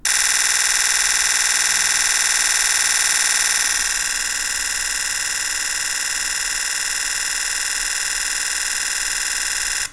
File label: z9 shutter